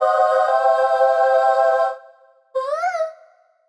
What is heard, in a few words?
angel chorus